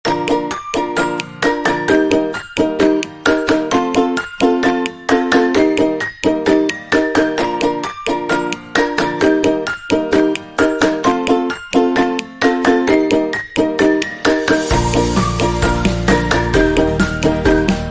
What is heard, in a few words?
happy cooking music gastronomy background music